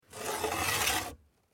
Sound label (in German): schiebetuer